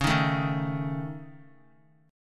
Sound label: C sharp Suspended 2nd Sharp 5th, Guitar; strummed; chordshape; x,4,3,2,3,x; JGuitar